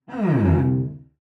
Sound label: Cello Slide Down; SoundsCrate